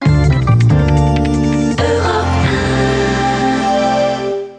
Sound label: jingle; Villagina; Village